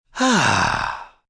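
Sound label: Sigh Relief male, Sigh, male; Sighing Sound Effects; Sound Effects:Voice; VoiceBox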